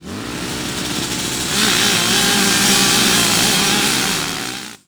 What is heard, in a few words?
Start, UI